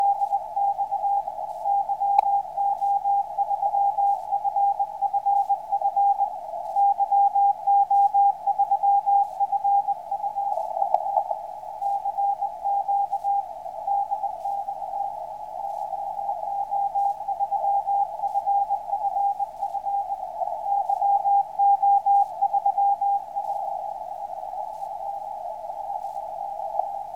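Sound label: 160m, signals on 160m band; YO3FFF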